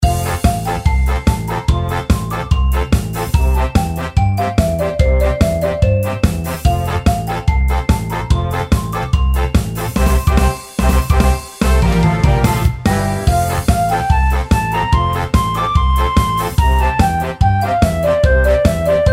Raid; Mist Train Girls

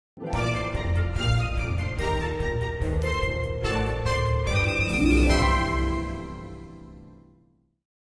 Level Cleared!

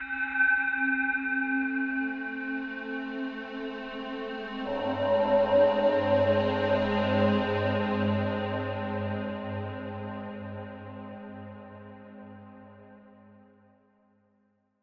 Grand Departure; Project Readjusted 3

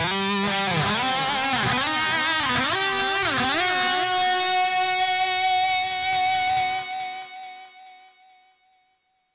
Drums and Jingles Camping Lead; Metal Tales Fury of the Guitar Gods

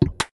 Skill Effect Equip; MilkChoco

